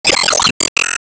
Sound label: Weaver wall Disappear